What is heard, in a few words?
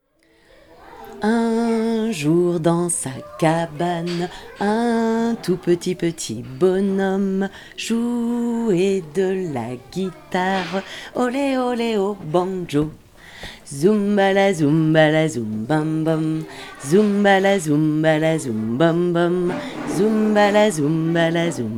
banjo